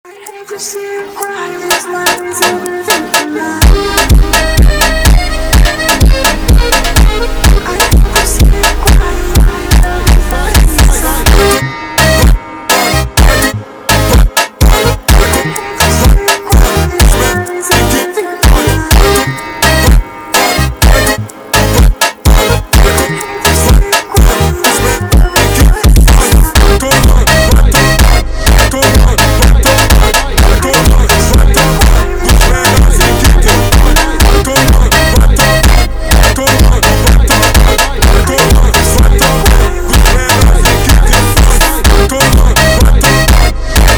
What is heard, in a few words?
Funk slowed